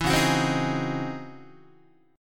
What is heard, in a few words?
Db-Minor Major 11th Guitar, DbmM11 chord; strummed; JGuitar